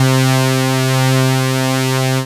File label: Ensoniq ESQ-1 Xplore C3